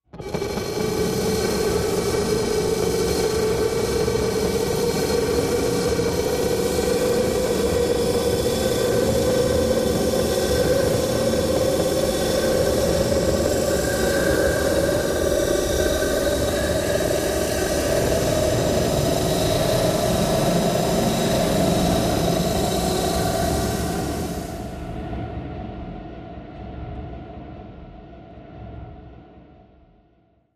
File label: Building Up Machine Fa, industrial